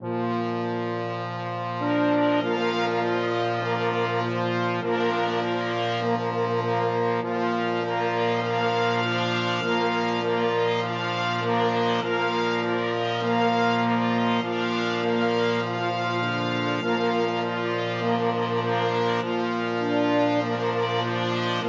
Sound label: toccata, flexible brass ensemble 5 players